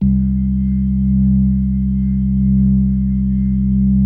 C2S, B3

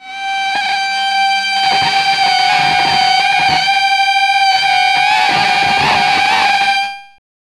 Feedback, Industrial; Nasty

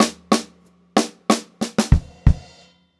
Funk-Groove Drumloops, 92 ST FILL; USB Soundscan vol.38; AKAI

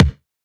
kick, Hardcore Breakbeat; Big Fish Audio; Hardcore Breakbeat Action; Riddim

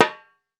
REPIQUE, Latin Drums; Latin Drums & Rain

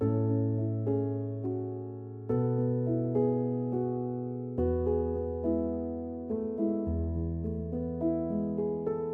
Emaj 105BPM Piano; 99Sounds; Monosounds; Music Loops; PANDORA BOX!; Radio Station

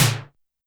Snare Synth, YAMAHA RM1X

